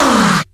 "ah", Voice

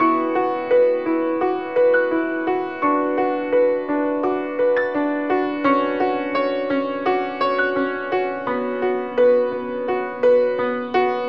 piano, Loops Sad

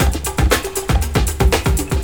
Drum loops

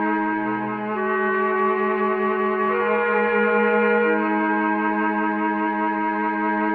flute, Loops, Sad

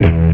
shredder, Guitar

